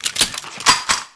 Gun Draw Select Weapon, Weapons Foley; SFX Kit

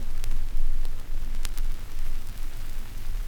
CRCK, Production Effects; XXL 800 Production Effects

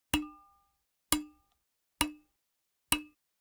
Generic Click Glass Small; boom library; The Interface

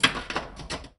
Pinball Ball Release

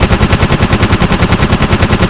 Assault Rifle Shot Loop; SFX Kit; Weapons

